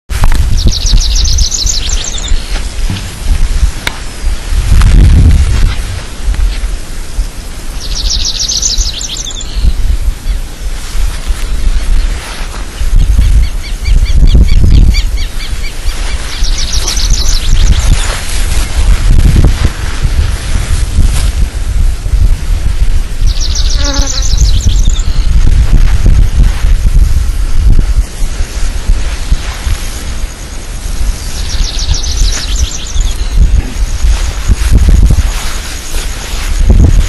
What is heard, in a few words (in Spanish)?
Cachirla Dorada; Ruta 40; Jorge La Grotteria